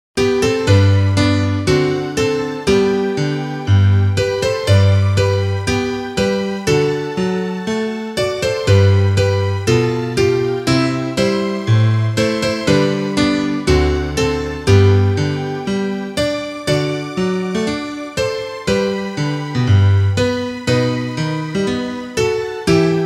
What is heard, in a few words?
instrumental